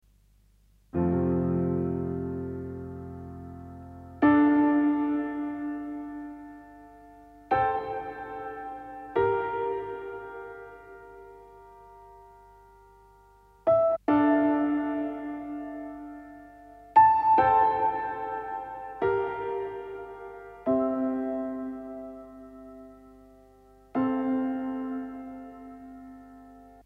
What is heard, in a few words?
PIANO